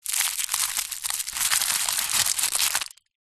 food easter egg foil unwrap

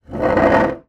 household chair wooden drag hard floor; zapsplat

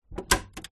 household hi fi cd tray top loader close

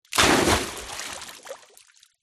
nature water splash

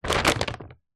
food flour packet large pick up grab from floor

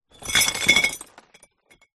broken glass in cardboard box tip; zapsplat

